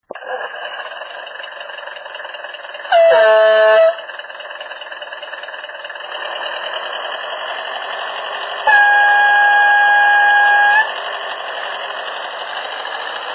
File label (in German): Rottenkraftwagen; VT SKL